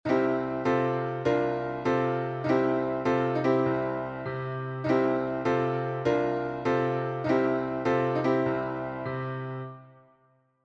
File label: backtracking, I-(I7)-IV, gospel piano; Enchainement I-IV; On attaque le FMaj7 avec sa dominante; Enchainements d'Accords